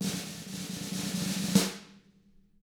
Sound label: SNARE ROLL